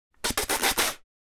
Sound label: Human spitting quickly multiple times